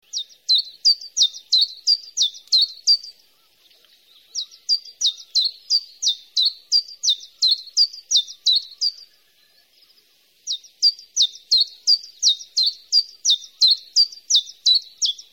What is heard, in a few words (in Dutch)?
tjiftjaf